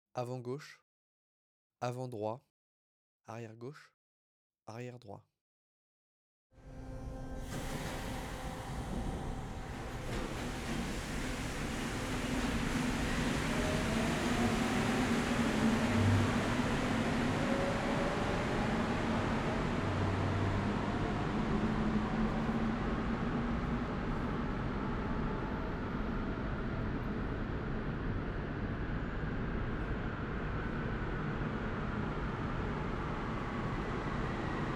AMBIANCE 4.0 MARSEILLE METRO PRADO QUAI ARRIVEE DEPART METRO PASSAGES VOIX; Quadro

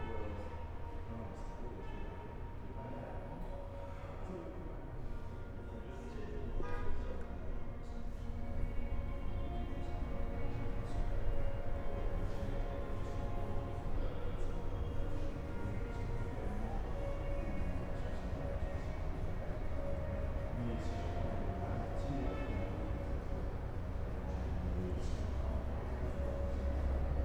AMBIANCE MARSEILLE PUCES HANGAR MUSIQUE VOIX KLAXONS RUMEUR VILLE; AMBIANCE MS; Stereo MS, 48 000

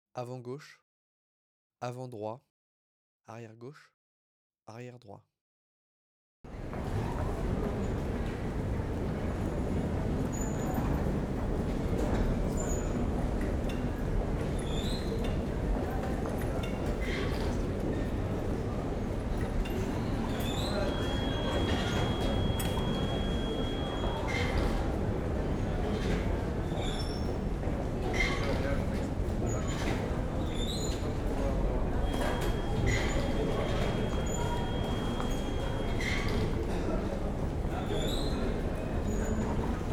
AMBIANCE MARSEILLE METRO STATION PRADO HALL PORTIQUES MACHINES TICKETS PASSA; AMBIANCE 4.0; PASSAGES VOIX

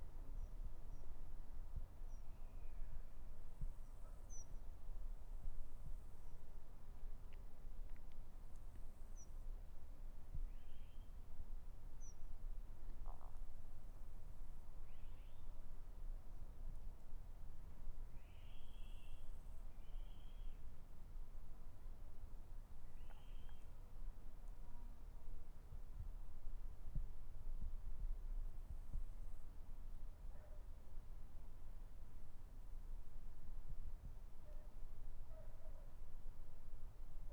AMBIANCE MARSEILLE NATURE CALME OISEAUX CHIEN RUMEUR VILLE LOINTAINE; Stereo MS

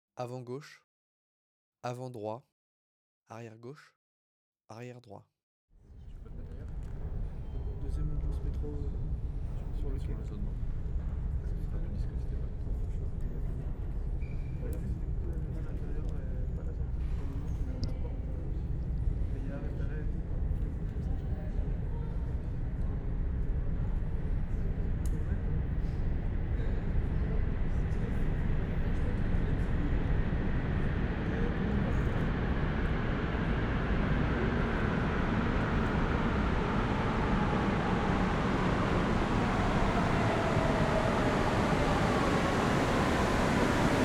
AMBIANCE MARSEILLE METRO PRADO QUAI ARRIVEE DEPART METRO PASSAGES VOIX; Quadro

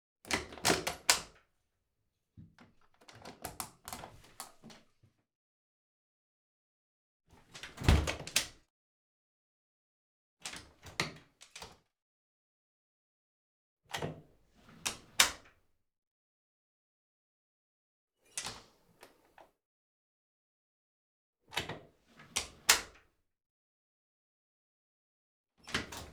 PORTE STUDIO BOIS VITRE OUVERTURE FERMETURE

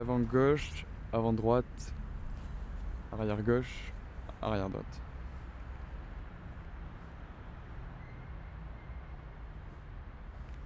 AMBIANCE MARSEILLE ST VICTOR CIRCULATION LOINTAINE ET PROCHE RUMEUR VILLE; CALME

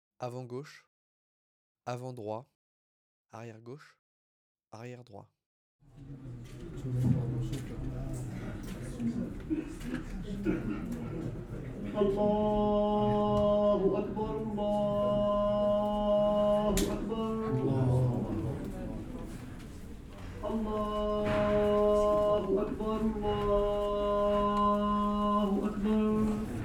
AMBIANCE MARSEILLE MOSQUEE PROCESSION IMAM PRIERES CHANTS VOIX RUMEUR VILLE; AMBIANCE 4.0; Quadro

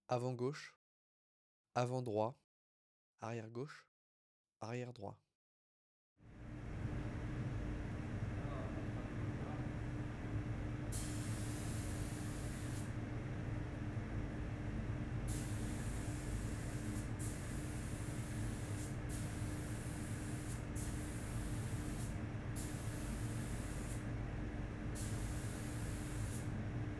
AMBIANCE MARSEILLE ATELIER MENUISERIE BRUIT MACHINE SPRAY; AMBIANCE 4.0; Quadro, 48 000, 24

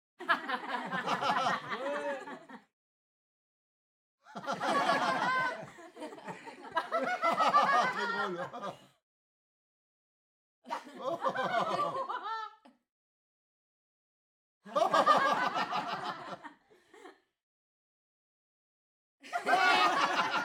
PUBLIC PETITE FOULE RIGOLE RIRES

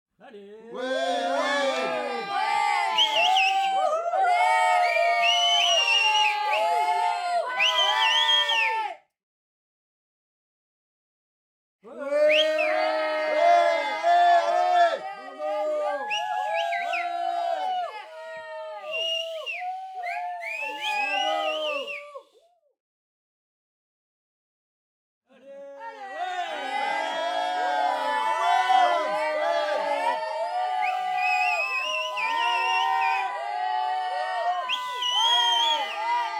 PUBLIC PETITE FOULE APPLAUDIT ENCOURAGE SIFFLEMENTS CRIS

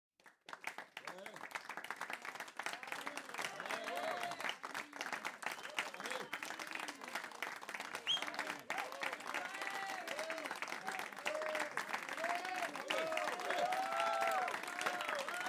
PUBLIC FOULE APPLAUDIT ENCOURAGE SIFFLEMENTS CRIS BRAVO

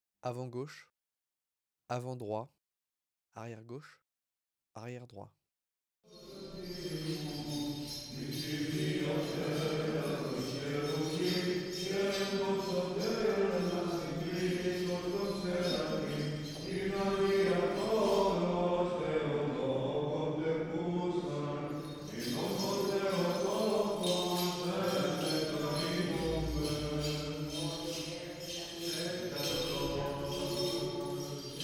AMBIANCE MARSEILLE EGLISE ORTHODOXE MESSE GRECQUE CARILLON CHANTS PRIERES; AMBIANCE 4.0; DISCUSSIONS

